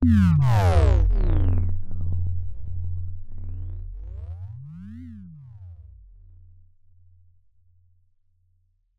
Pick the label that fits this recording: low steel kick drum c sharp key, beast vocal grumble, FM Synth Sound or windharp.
FM Synth Sound